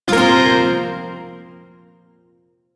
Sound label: Blues Chord C; Shawzin; Warframe; Duviri Shawzin